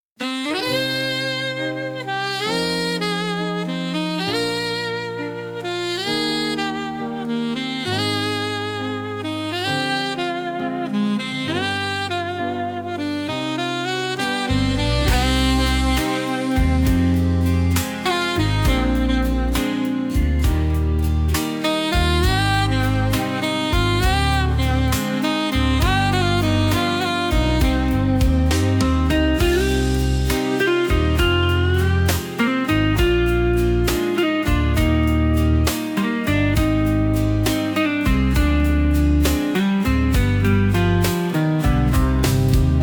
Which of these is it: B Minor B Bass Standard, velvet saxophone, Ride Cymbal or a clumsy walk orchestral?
velvet saxophone